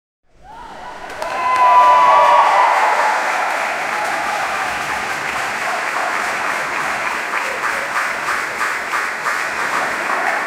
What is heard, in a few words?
a sound effect of an, ceremony